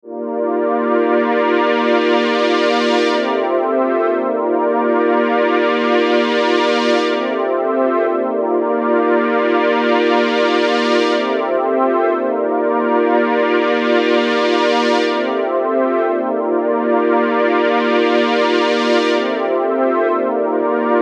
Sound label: Dirty Little Pad Dry 120 C